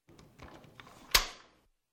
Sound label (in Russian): Protivotankovoe reaktivnoe ruzhe perezaryadka